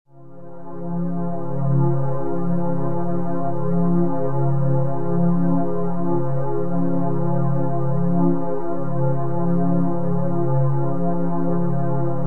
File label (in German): basiston